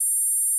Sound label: Gauss Filt F#6